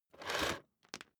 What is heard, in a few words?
vacuum cable drag out single